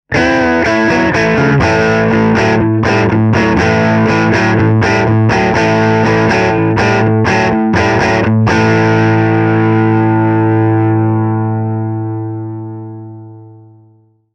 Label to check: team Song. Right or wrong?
Wrong